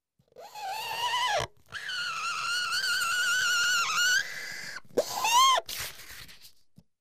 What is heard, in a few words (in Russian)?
skrip flomastera po bumage